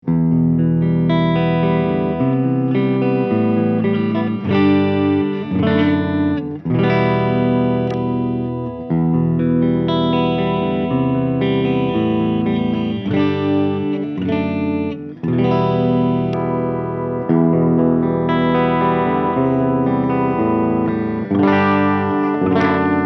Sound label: Orange TH100 Clean